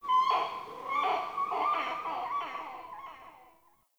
Night Creature Medium; Ambience; Night One Offs

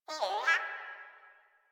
Flying Robot Voice; Warframe; Veilbreaker; Quests; Ambience; Kahl

